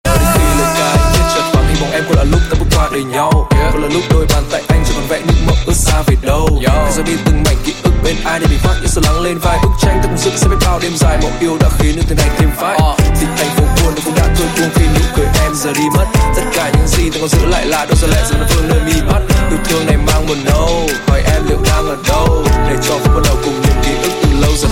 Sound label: rap